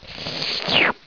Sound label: slurp